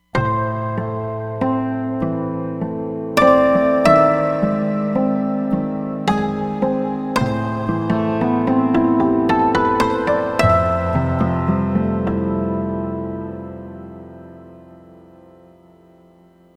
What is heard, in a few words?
Ethno Plucks; Roland Fantom XR